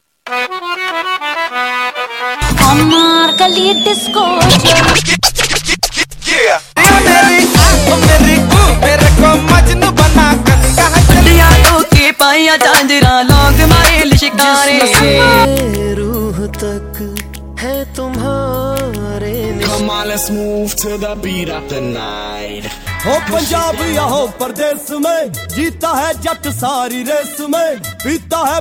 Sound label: Bollywood Mixing